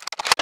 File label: supply collect; Carnage Contest